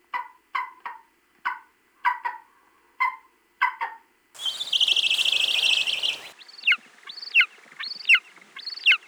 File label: Golden Eagle